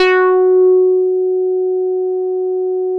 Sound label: F#4, Bass; E-MU Producer Series Vol. 6 – Analogia Project; Boom da Bass